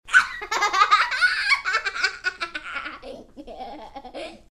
korotkij zarazitelnyj smeshok

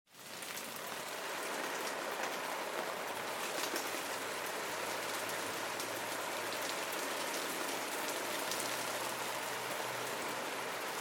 na chto to s metallom

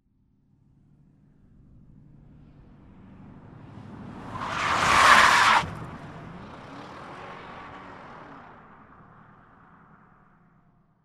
rezkij korotkij razvorot

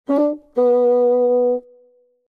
vnezapno chto to uznal

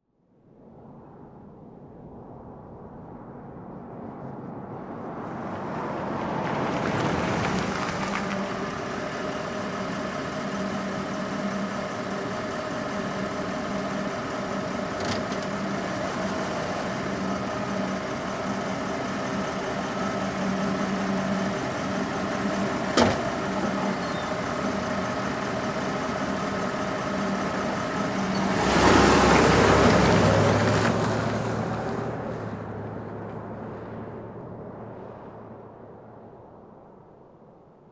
podezd ostanovka hh i otezd

